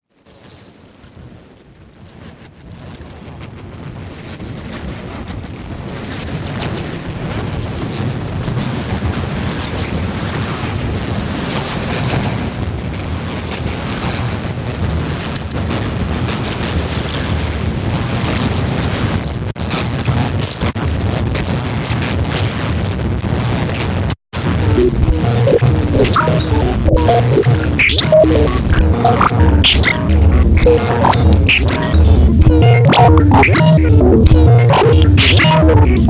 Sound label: 65bpm